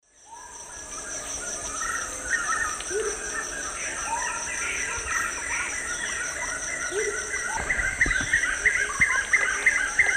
Monasa morphoeus, group song; S. America; Eastern Lowlands

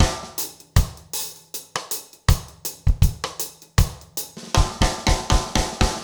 reggae

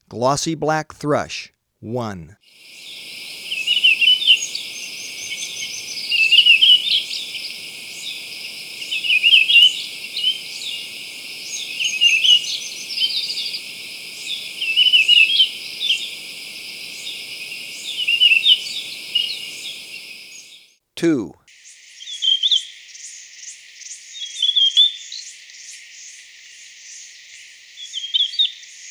Glossy-black Thrush, Birds of Ecuador; S. America

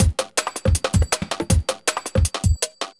jungle juice 160

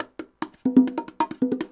bongos 140 bpm, latin; Sound Effect Library

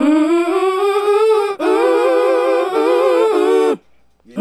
HIP-HOP R&B VOCALS

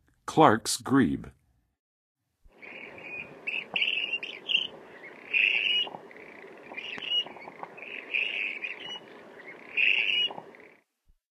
Clarks Grebe; North America; Western USA; Western USA Songbirds

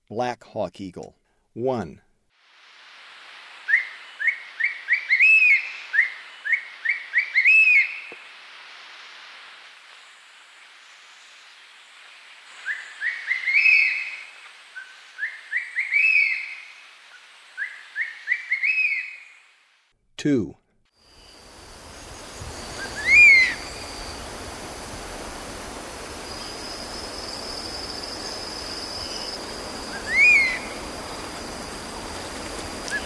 Black Hawk Eagle, Ecuador; S. America

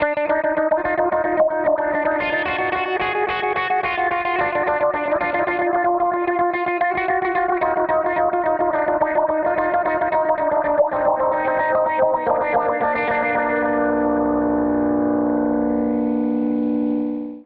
ELECTROCUTION

